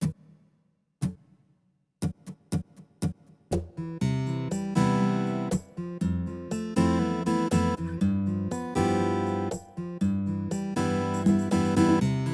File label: style pop and rock unplugged, yamaha tyros3